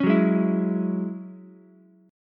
F sharp 7th Suspended 2nd, Ukulele; strummed

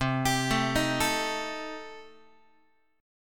C Minor 6th Guitar, chord; Cm6 arpeggiated; JGuitar

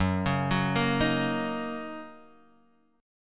F sharp 6th, chord

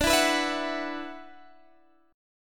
F sharp 7th Suspended 4th Sharp 5th D

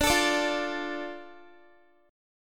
D Minor D Guitar; Dm strummed; x,17,19,19,17,x

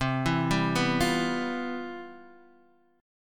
C Major 11th C; CM11 arpeggiated; JGuitar

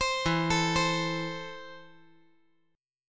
E Suspended 4th Sharp 5th

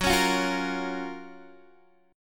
Gb Major 7th Gb down Guitar DADGAD, chord

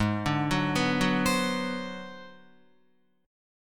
Ab 7th Sharp 9th Flat 5th Guitar, chord; Ab7#9b5 arpeggiated; JGuitar

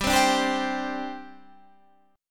G Suspended 4th, Guitar, Standard; Gsus4 strummed; JGuitar

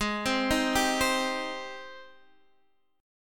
Ab Major 7th Ab down; AbM7 arpeggiated; JGuitar

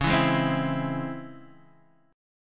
C sharp Minor Major 7th C sharp Guitar Open D

